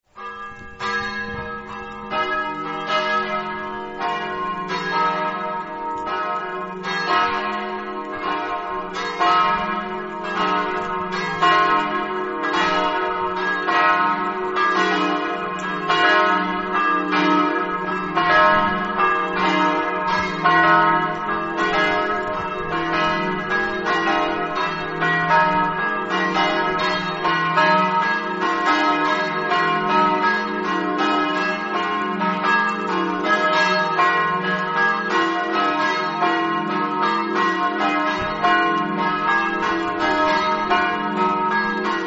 torlano, campane

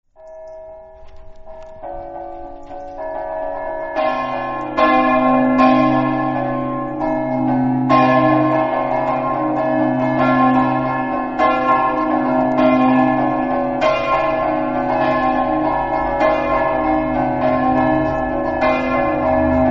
campane, Treppo

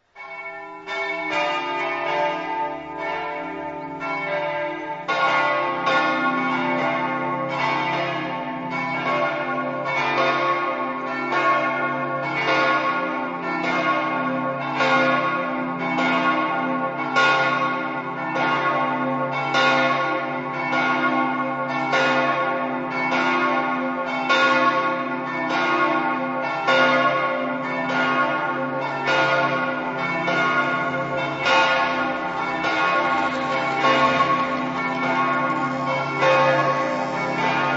campane; malborg

